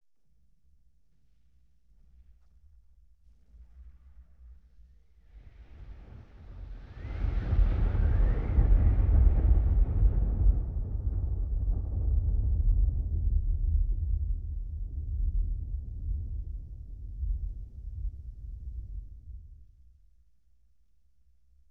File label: Explosion, 5.1 Surround